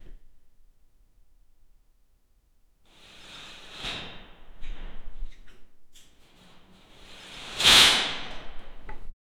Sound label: the curtain opens on the, theater